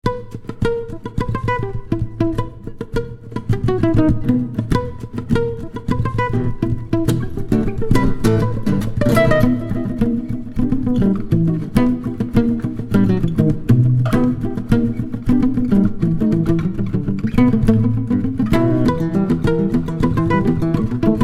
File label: Mischevious Gtr; Mischevious Guitar; Intense, Quirky; Acoustic/Soloistic; Jane the Virgin